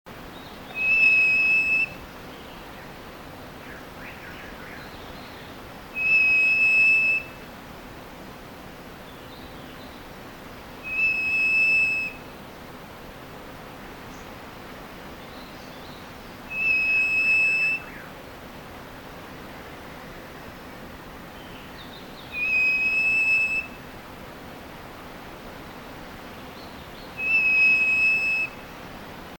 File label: Purple Cochoa Cochoa purpurea